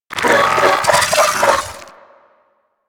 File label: Amalgam Moa Satyr Vocal Idle; Warframe